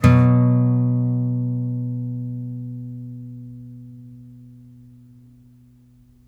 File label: 5THS, Blues Guitar; ILIO; Fretworks